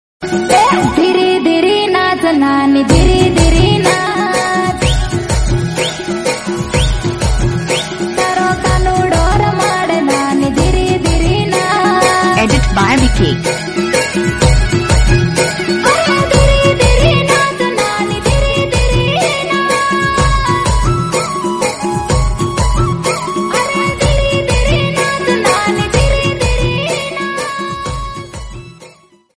bhajan

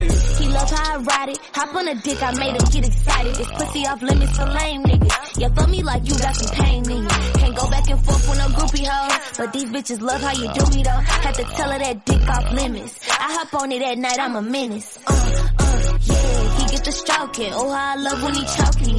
remix english song

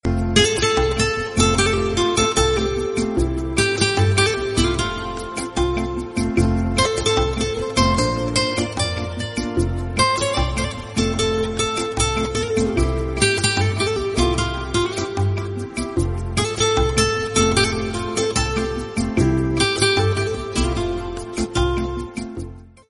bgm theme instrumental; mahaveeryar

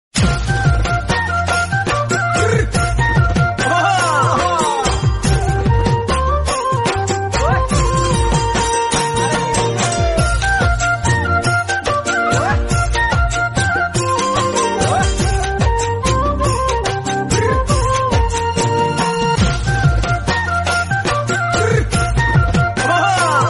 bihu music, assamese

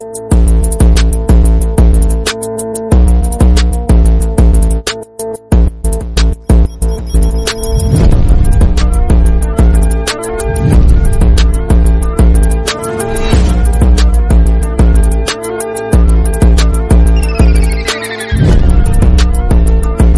slowed instrumental; shunsui bankai

